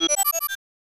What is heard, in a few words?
Crystal